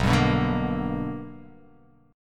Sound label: B Minor Major 7th Sharp 5th, chord